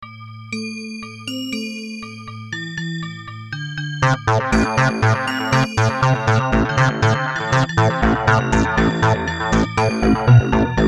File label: Where are bells